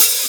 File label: Open Hihats, Cymbals